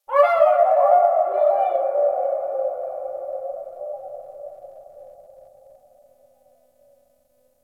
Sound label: Ocean Underwater Creatures Medium; Ambience; Warframe; Grineer Ocean